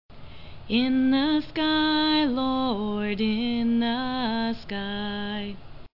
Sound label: correct vocal